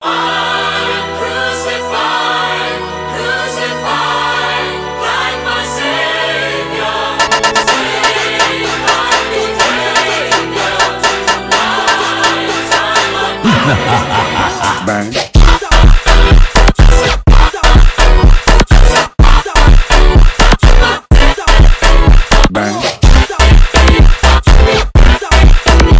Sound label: phonk, funk